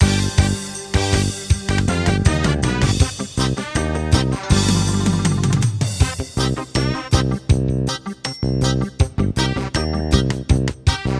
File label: reggae